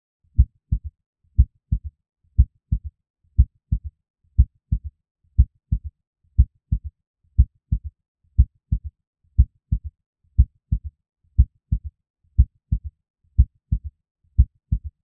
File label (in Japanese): 心音 Ⅲ音